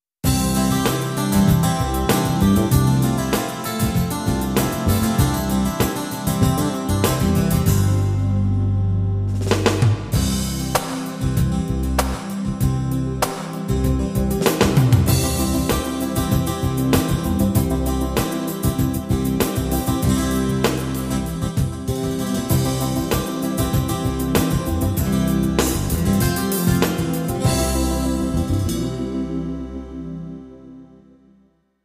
balad

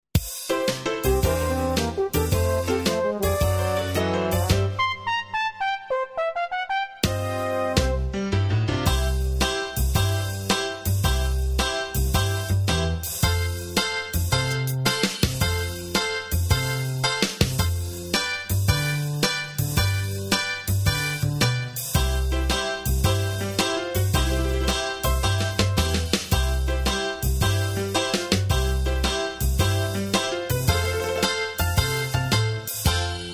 new orlean

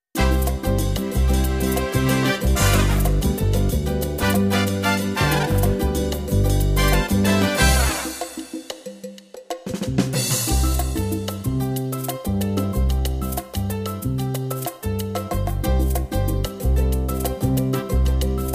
Salsa

